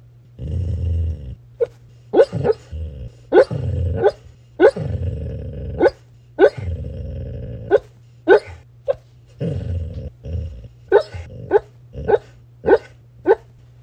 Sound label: commonthroat impression